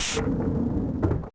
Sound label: Door slide, bunker